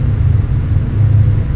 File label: ambient loop; effects; troopers